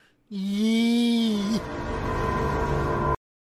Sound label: "eeeeeeeee"